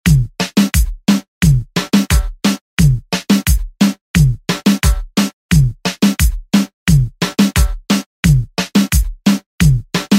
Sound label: Party Hype Redrum; Deejay Hit